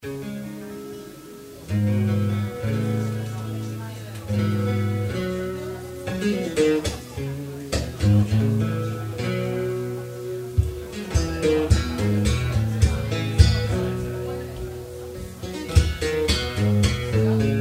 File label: Tuning